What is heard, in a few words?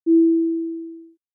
message loud